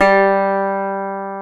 GTR, Dobros, National

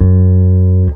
E bass (F#); Sonic Foundry (Sony Creative Software); Ugly Remnants VOL-2; Basstuff

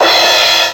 Casio RZ-1, Drums

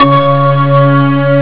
organstring; haunted; OCEAN; jazz jackrabbit 2

